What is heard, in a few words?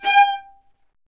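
Marcato, Strings; Vlas Marcato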